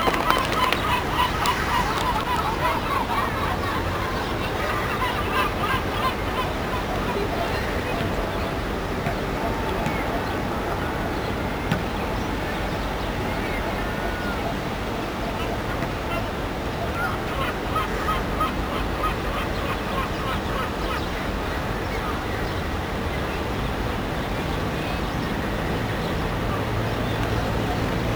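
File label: Seagulls beach, exterior; ENV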